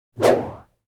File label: swish electronic; crashes swishes impacts; Asian Show Sound Effects